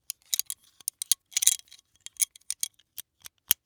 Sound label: Industry Tool Pliers Movement Adjusting